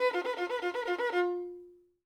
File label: violin